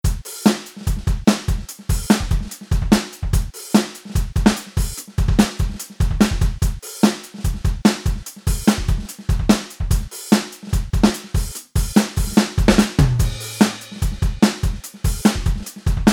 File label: Only Bass, funk